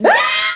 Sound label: bowser; mario 64